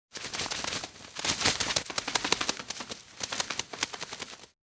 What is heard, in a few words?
Bird Wings Flapping; Fairlight Sound Lib